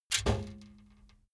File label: trash basket metal hit rattle